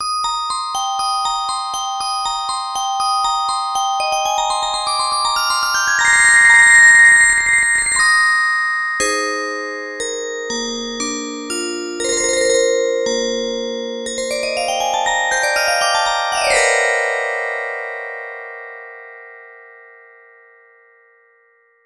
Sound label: Quadra, carillon